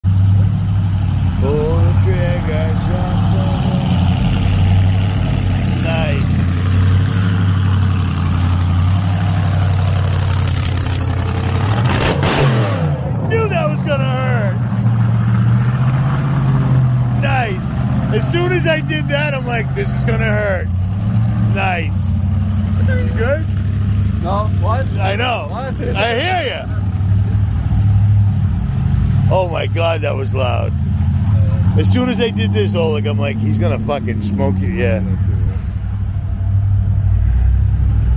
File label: BMW with Blower and Opens Pipes; Blows out my eardrums